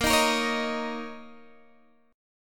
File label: Bb Minor Double Flat 5th, Guitar, DADGAD